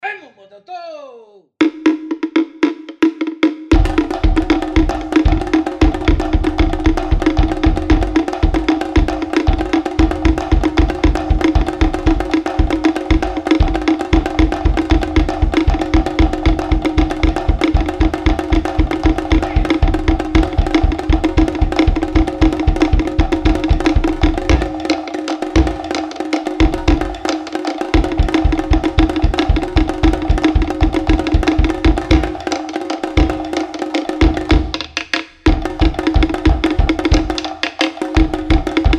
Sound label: hula drum dance